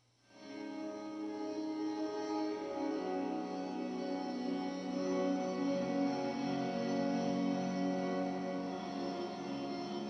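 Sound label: square drone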